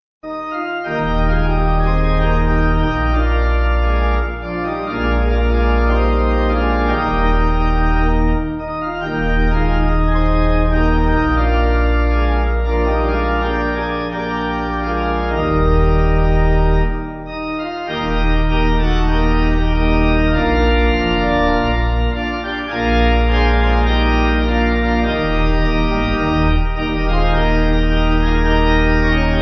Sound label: What A Wonderful Wonderful, Pipe; Graves